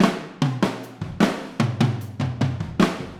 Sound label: Big Bottom Fill Overhead ST, Drums; Sonic Foundry (Sony Creative Software); Drums from the Big Room The Mixes; Demo Projects